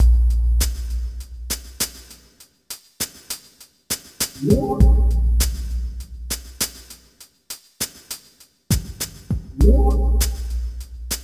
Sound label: Water; Cake Mania 2 Jill's Next Adventure